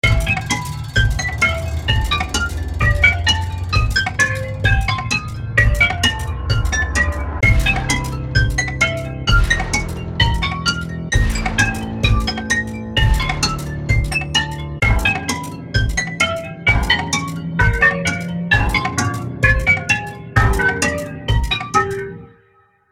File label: Perc FX Tonal